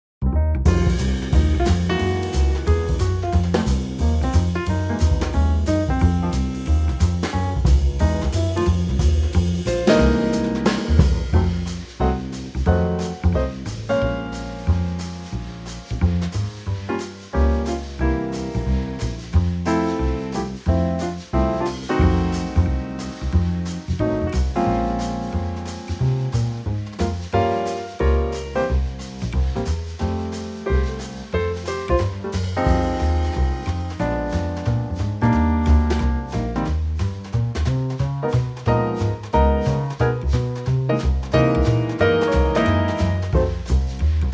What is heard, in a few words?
F Trio